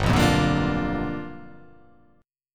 G 7th Sharp 9th G chord

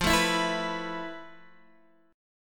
F Major Sharp 11th, Guitar DADGAD

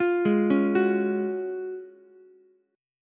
F sharp Major 7th Suspended 2nd, Ukulele; arpeggiated; JGuitar

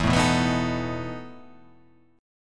E Major 7th Suspended 4th

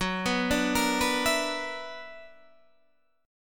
B-Minor Major 11th

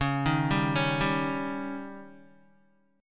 Db Suspended 2nd Flat 5th Db down Guitar; 4F,4A,5C,5G,5C,6E; arpeggiated

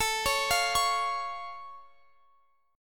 C sharp Augmented A, guitar chord

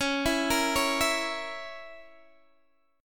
C sharp Minor 6th C sharp, Guitar Open D

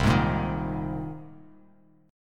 C Minor 7th Sharp 5th C; Cm7#5 strummed; JGuitar

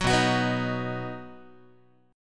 B Major B, Guitar; strummed; JGuitar

